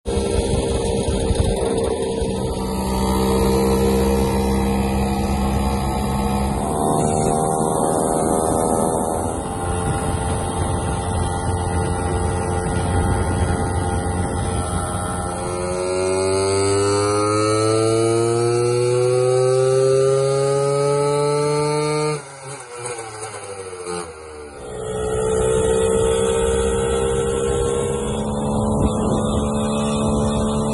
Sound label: Lambretta RB256 Special